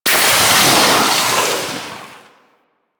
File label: Infested Mist Fissure Closed B; Warframe; Infested Eris; Encounters